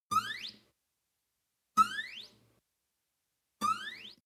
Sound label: string chirp slide up